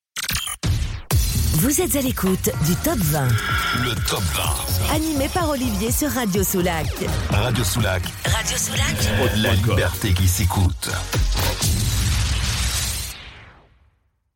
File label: Radio Jingle Top 20; Radio Soulac